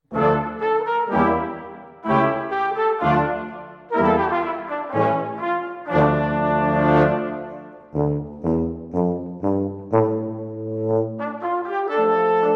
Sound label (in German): Blasmusik in Moll